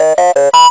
tone, Cartoon Film Effects

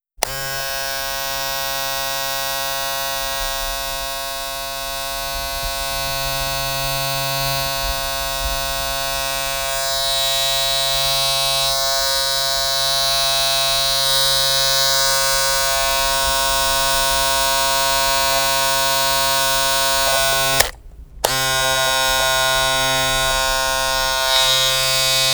Razor Electric Buzz; Home & Office

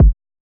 Kick, G; Drum One Shots; Kicks; Cymatics; Eternity Sample Pack

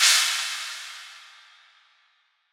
Crash; Cymbals; Ghosthack; Color Bass Essentials

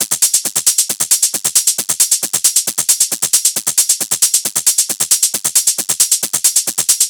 Hat Loop 135, Techno

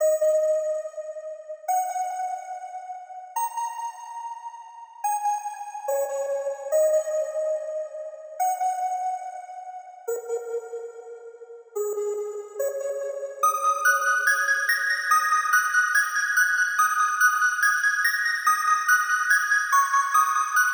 143 BPM D# Min Bell Synth; Melody Loops; Loop Stems; Cymatics; Hyperbass Pt 1

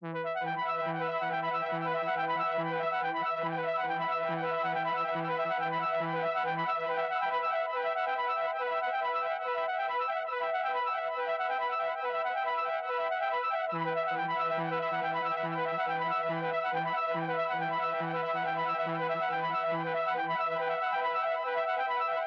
140 BPM E Min Arp, melody loop stem; Cymatics; 2022 Melody Collection; Wick

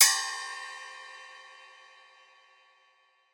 Ride; Cymbals; Ghosthack; Color Bass Essentials

